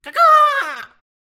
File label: Bird Red Special; fowl